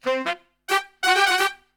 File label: SOUL F -G, Brass, SOUL LICK; Best Service; Brass Super Section